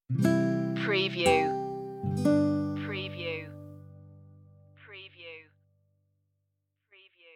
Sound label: christmas spirit stinger